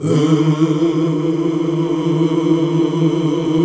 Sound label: Gosp. Oooh; Sound & Vision - Gigapack II CD 1 (Roland); HAL Gosp. Oooh 2